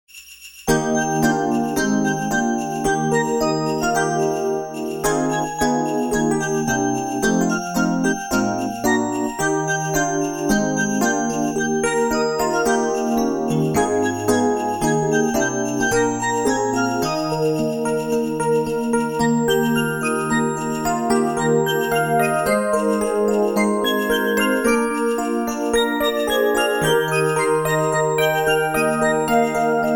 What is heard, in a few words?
Jingle Bells